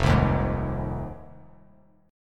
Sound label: F Minor 7th F; JGuitar